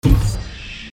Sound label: noisy impact